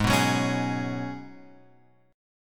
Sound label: G sharp Minor 11th G sharp, guitar chord; G#m11 Chord {4 2 4 X 2 4} strummed